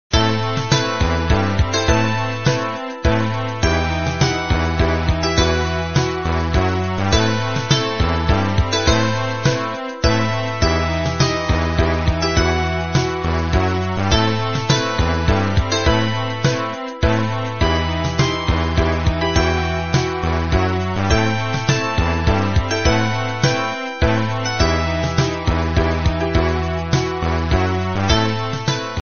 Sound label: classical english movie song tone ringtone